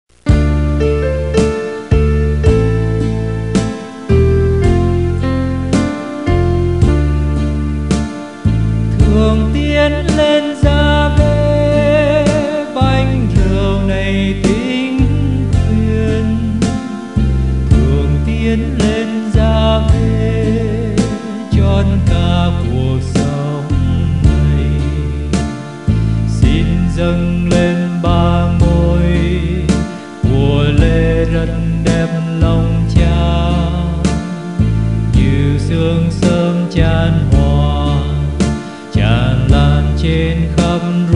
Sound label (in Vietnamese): ALTO